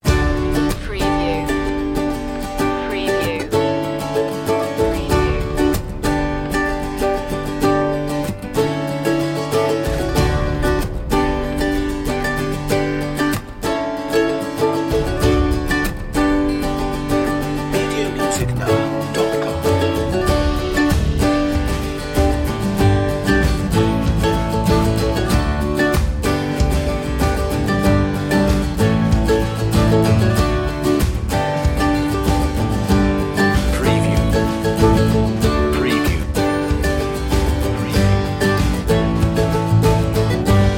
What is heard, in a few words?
chant no vocal